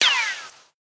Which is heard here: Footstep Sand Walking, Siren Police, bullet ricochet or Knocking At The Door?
bullet ricochet